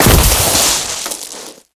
gravel 2 hard land; Original TK Sounds

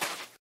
sand step; Golden Crayon Sounds

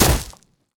gravel hard land; Original TK Sounds

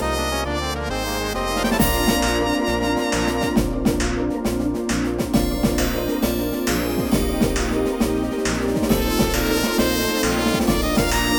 battle victory; Manamon Music; Manamon 2

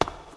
cave grounds step; Golden Crayon Sounds

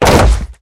old wood fall; Golden Crayon Sounds

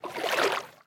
shallow step; Golden Crayon Sounds

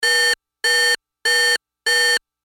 vehicle low fuel; Original TK Sounds

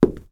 wood step; Golden Crayon Sounds

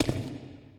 stone step; Original TK Sounds

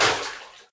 sewer fall; Golden Crayon Sounds

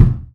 bathtub step; Golden Crayon Sounds

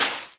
sand step; Original TK Sounds

